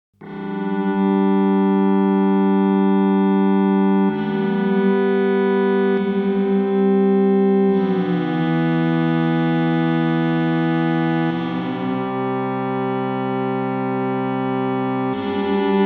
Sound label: EHX Pico Deep Freeze Auto Mode u Gliss 13h